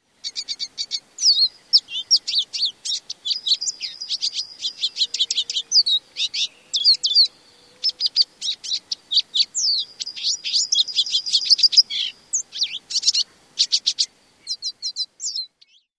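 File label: Lesser Goldfinch extended song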